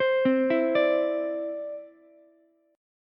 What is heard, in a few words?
C Major Add 9th, Ukulele; arpeggiated; JGuitar